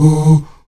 VOX AHOO, Afro Chants, Stacc Ah Oos; Roland LCDP11 Africa VOL-1

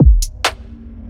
Drm Chp, Drums

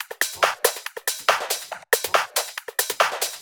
Loops 140 BPM Hihat Percussion Loops

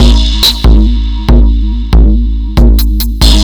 Drums Strange

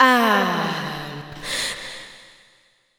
VOC; Best Service ProSamples vol.48 - Disco Fever; AKAI

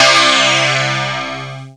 SYNCLV FUZZ, SYNCLAV HITS; Greytsounds VOL-1

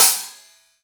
Trash, EMU Orbit-3 drum machine; 300 Drum Machines

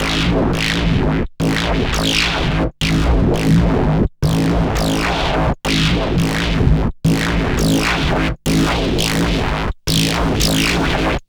Mad Bass, Drum & Bass; Fluid Dynamics

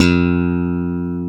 CHOP F2, bass

